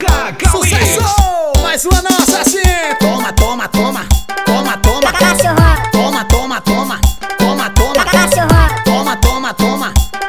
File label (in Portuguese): cumbia